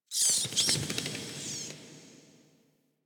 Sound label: Interior Cave Bat; Warframe; Ambience; Duviri